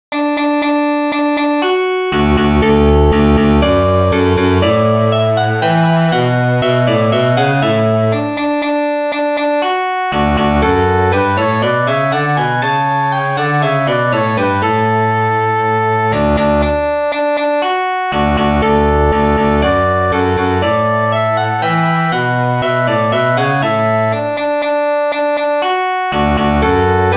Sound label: flute cello harpsichord; The Cornwall March; James Oswald